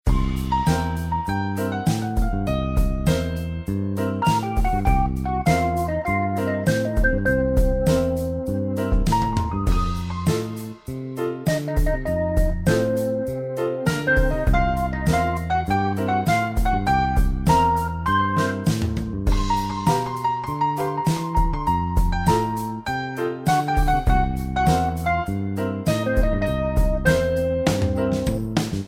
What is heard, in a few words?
bg hall